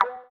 VIBRA WAH, guitar; Spectrasonics; Bizarre Guitar

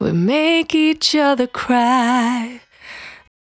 Cry 68bpm B, Vocal; Zero-G

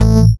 Rez Bass A One Shot; Drum & Bass

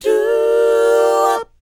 DOWOP C, Vocal R&B DOO WOP; ILIO; Vocal Planet

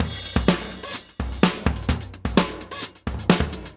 Funky 16 127, Beat Loops; Zero-G; Monster Beats

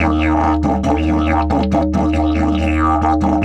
EF 140, DIDGE RIFFS

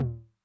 Med Tune Med Decay, 909mod MID TOM; classic machines; MT - MID TOM

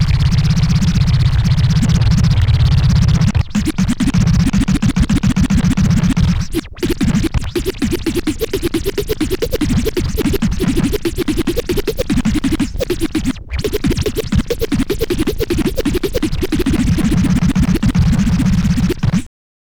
long scratch, Vinyl Scratches

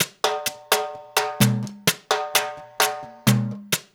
LOOP, Percussion, Danzon; InVision Interactive; Percussion Slam

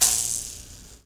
MOBO HI, MOUTHBOW, Heart of Africa

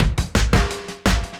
Beat Style E 170, breaks; 170bpm

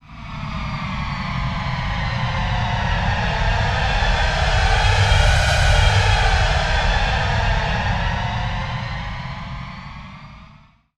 Fly Over, Flyers; Zero-G Seismic Frequencies

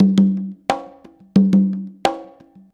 CONGA, Modern Percussion Loops

